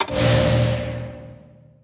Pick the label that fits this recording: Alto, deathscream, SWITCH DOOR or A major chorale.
SWITCH DOOR